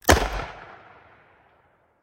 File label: 357 Magnum Revolver Gunshot With Tail